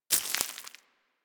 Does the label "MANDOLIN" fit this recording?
No